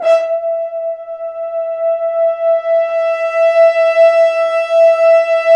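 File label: french horns sfz; Roland L-CDX-03 Disk 2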